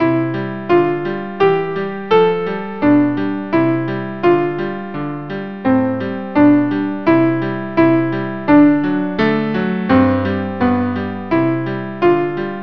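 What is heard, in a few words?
viola cello; stuckchen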